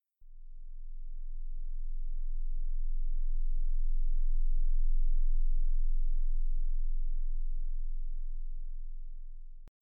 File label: tone